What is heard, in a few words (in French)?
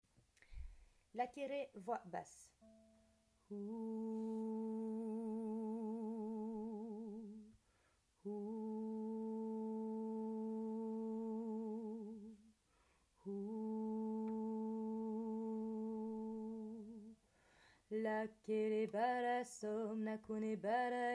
basse